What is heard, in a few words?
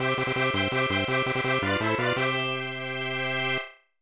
Starting Fanfare; Super Keiba; SNES